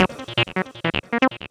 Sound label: Arp, Keyboards